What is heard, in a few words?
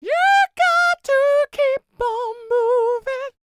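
falsetto, R&B Dance; Spectrasonics Vocal Planet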